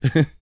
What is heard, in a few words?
LAUGH, Vocal Jazz Fx